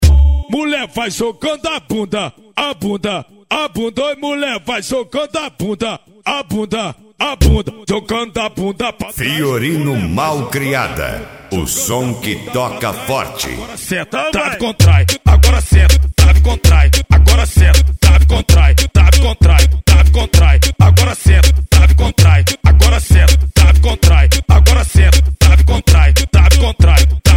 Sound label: mega funk bass